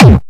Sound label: Attack Kick